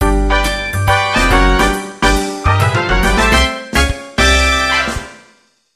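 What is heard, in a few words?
Jingle, Super Game Boy; Mahjong Taikai; PSP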